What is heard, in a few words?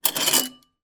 household cutlery handful pick up from plate